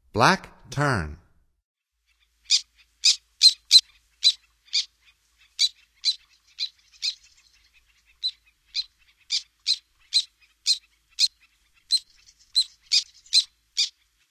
Black Tern; North America; Stokes

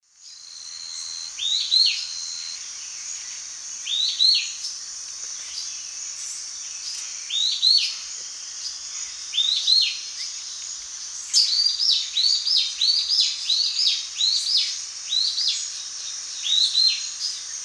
Hylophylax punctulatus, duet; S. America; Eastern Lowlands

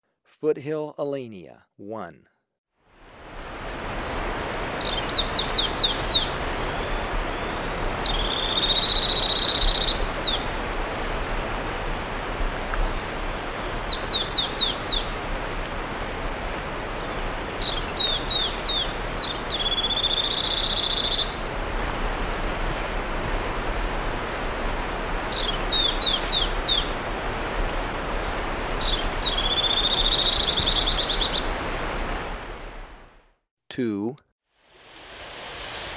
Foothill Elaenia, Birds of Ecuador; S. America

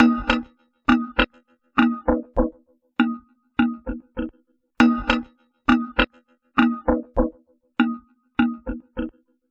Cupped Drum Beat; Electronica; Solo Beats

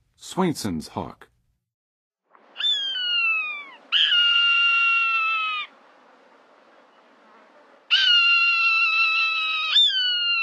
Swainsons Hawk, Birds of North America; Western USA; Western USA Songbirds

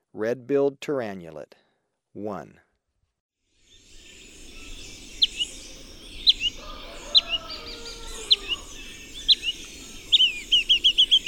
Red-billed Tyrannulet, Birds of Ecuador; S. America

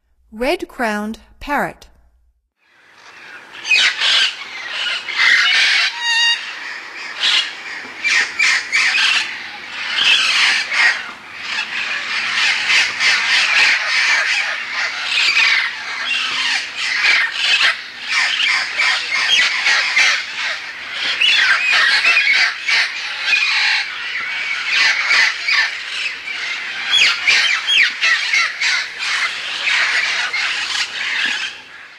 Red-crowned Parrot; North America; Stokes

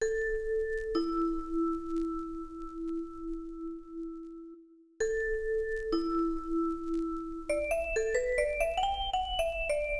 Hi Hat Jazz, 96; Hip-Hop

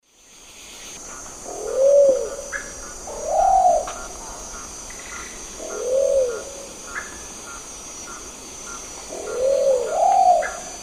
Patagioenas plumbea, calls 2 birds; S. America; Eastern Lowlands

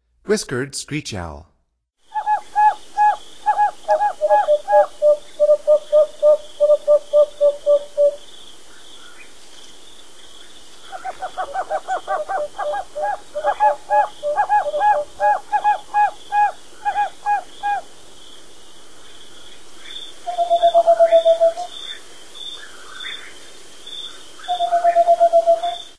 Whiskered Screech-Owl; North America; Stokes

